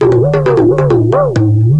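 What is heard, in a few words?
rhythm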